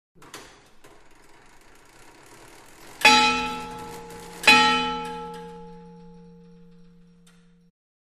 Clock 10 Tower 19th C, bell towers

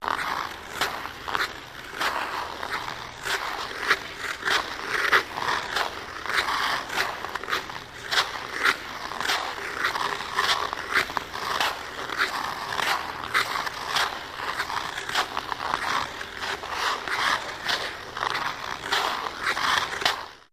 Ice Skating Steady

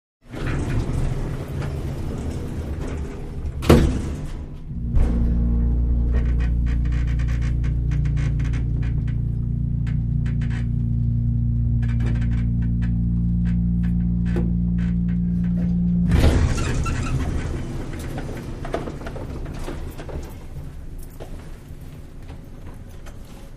Cargo Freight Elevator

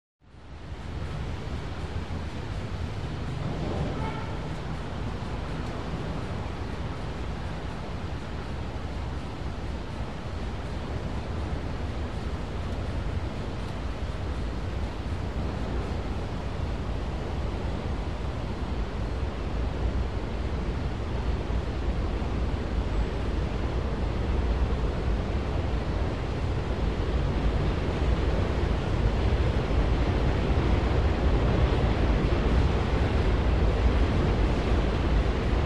El Train Exterior By

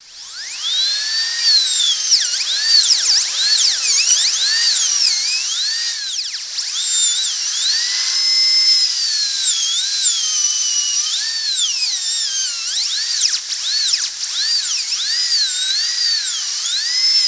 Drill Dentist Wind U; hospital & lab equip

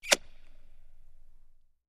Kiss Echo; kissing

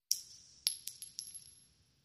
Shell Casings Single

